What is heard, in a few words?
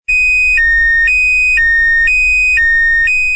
bell alarm 2tone